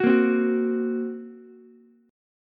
Bb Suspended 2nd Sharp 5th, Ukulele; 11,10,8,9; strummed; chord shape; JGuitar

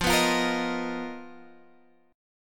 B Major 7th Flat 5th F, chord shape

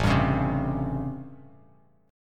A 7th Sharp 9th, Bass Standard

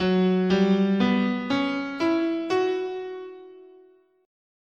F sharp Traditional Japanese "in sen", scale

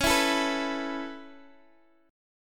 C sharp Minor 7th Sharp 5th, Guitar DADGAD; x,x,11,9,12,9

